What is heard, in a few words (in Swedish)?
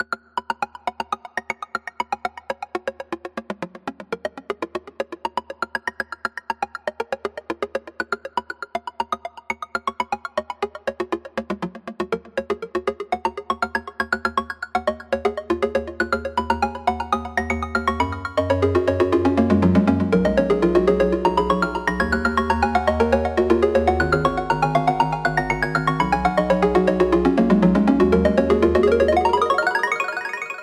ring